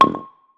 ambience bet Sign; netent

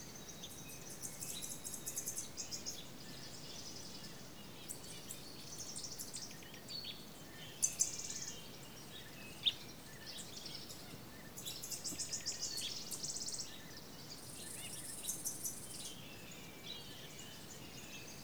ambience base; netent

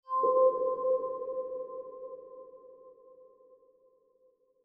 slot feature splash start

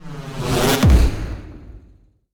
sign smash; netent

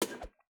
slot reel bounce

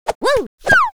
symbol Drop

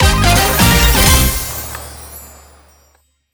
big win end; netent

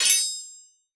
button max bet; netent

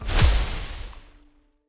collect win; fruitblaze; netent

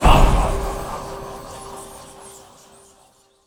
bonus hit new; netent

